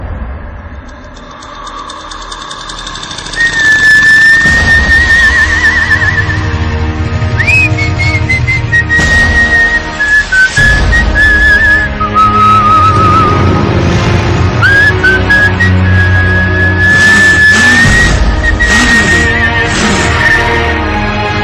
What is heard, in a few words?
Yamaha Fz Whistle